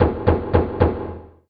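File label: door knock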